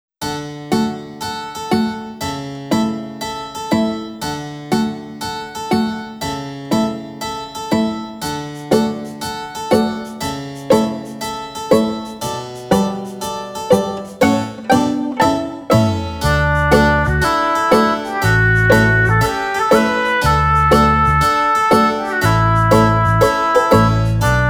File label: pizzicato; Furukiss; Floral Shower